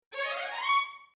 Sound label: string phrase